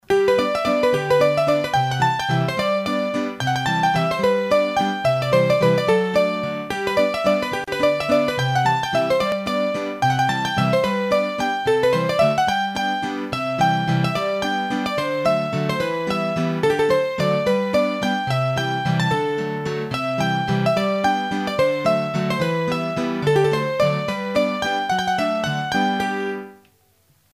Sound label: Mazurka, hurdy gurdy; Massif Central; Mazurkas